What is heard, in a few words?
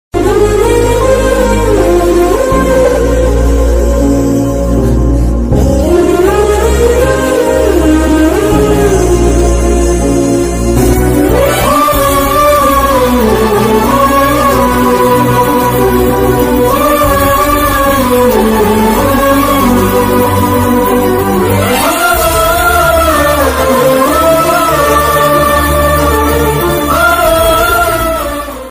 bodyguard climax emotional bgm ringtone heartouching bgm ringtones telugu bgm ringtones